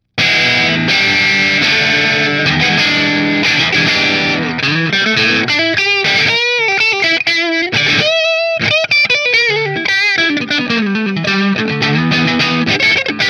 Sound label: Middle Mini Position 1 Marshall; Melancon